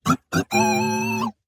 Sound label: triumphant beeps; 堡垒（守望先锋2）; 升级